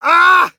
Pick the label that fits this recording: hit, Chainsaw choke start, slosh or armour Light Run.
hit